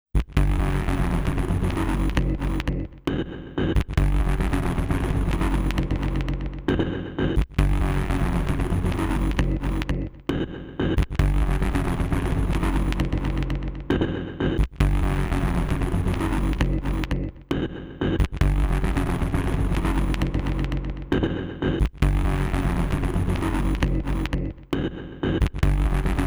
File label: Gravel Bed Lock Groove 134 BPM; Electronic